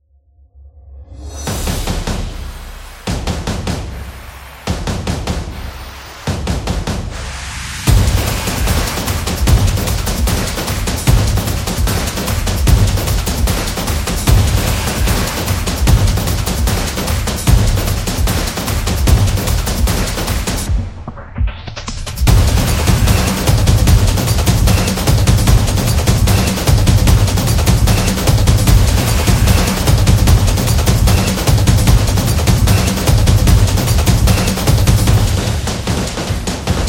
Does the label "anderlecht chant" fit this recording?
No